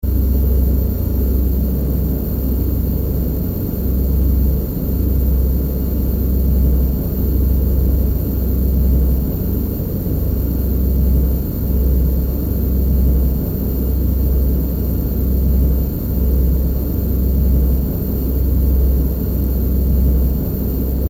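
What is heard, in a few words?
noisy interior atmo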